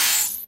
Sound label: shield hit